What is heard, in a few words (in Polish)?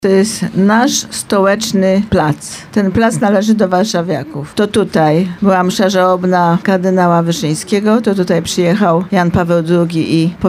waltz